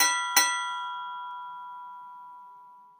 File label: Golden Bell; PomoLuxe